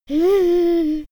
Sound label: little girl dreamy; soundpool